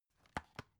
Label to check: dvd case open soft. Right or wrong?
Right